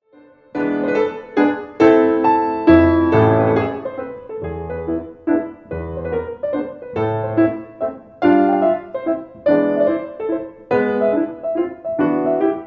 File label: Mazurka